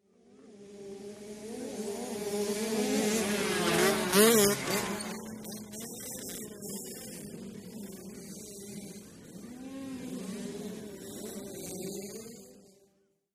Motorcycle Motocross; By 50 Cc ( Several ), Cu Fast Bys R / L, Shot Cu.